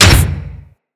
Jackal Step Metal, Machines; Steps

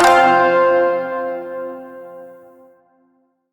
Shawzin Chromatic Chord I; Chords; Warframe

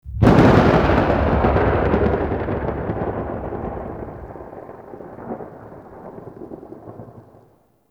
Narmer Outpost Thunder; Warframe; The New War; Ambience

